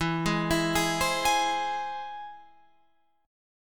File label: E Augmented, Guitar, Open F; E+ arpeggiated; JGuitar